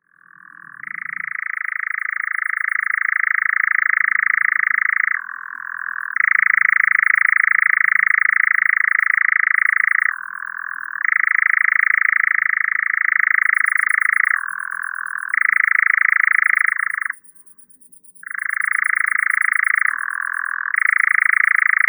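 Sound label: European nightjar, Caprimulgus europaeus; kozodoi obiknovennii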